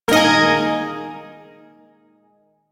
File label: Yo Chord H; Warframe; Duviri Shawzin